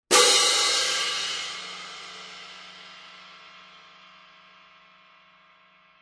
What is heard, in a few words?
Sabian 17" HHX Synergy Heavy Cymbals; Loud Crash